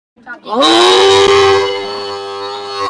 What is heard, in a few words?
aaaaaaaaaahhhhhhhhhh